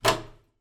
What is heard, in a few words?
household door bolt